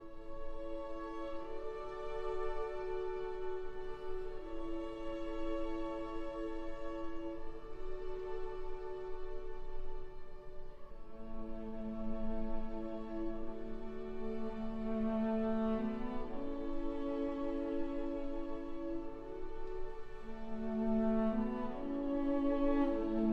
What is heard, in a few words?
for cello and strings